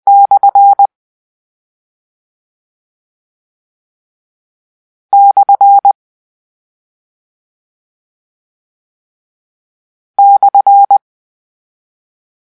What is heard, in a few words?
Slant Bar, morse code